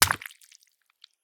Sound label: source flesh drops oranges; mkh8060; Sonniss GDC